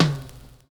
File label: OLD LP, Kitch 70s; Univers Sons